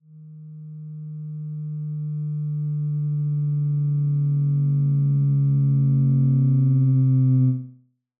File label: Bizzare Rumble; free game sound effects library